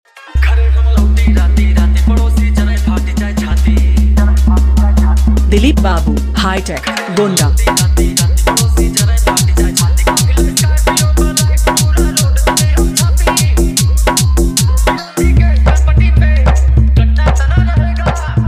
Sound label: Full Vibration Bass Mix